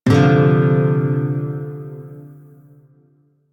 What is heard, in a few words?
Shawzin Min Chord D; Music; DE; Warframe